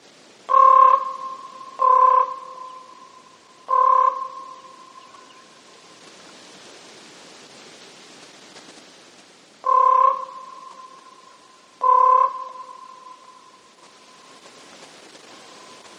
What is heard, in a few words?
Common Raven Croak Calls, Popping Call, and Water Droplet; alaska acoustic highlights